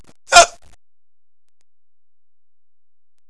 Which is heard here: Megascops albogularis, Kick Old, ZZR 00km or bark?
bark